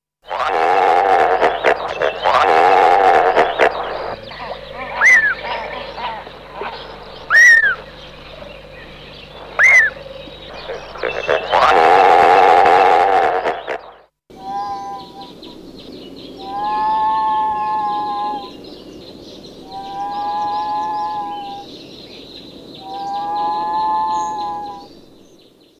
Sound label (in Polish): dropie, ptaki; Przyroda ptaki i zwierzęta